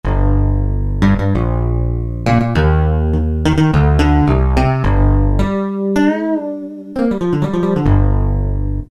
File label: Electric Pick Bass; Roland PN-D50-04